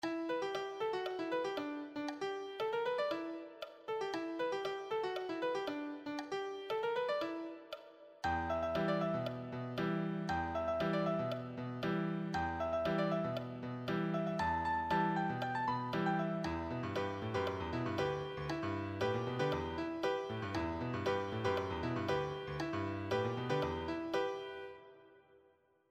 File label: solo, piano